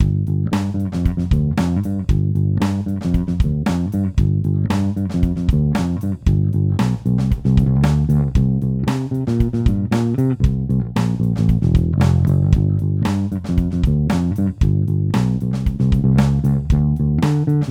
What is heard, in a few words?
Tbird Fingerstyle Neck